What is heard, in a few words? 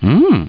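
eat fruit; Pacman English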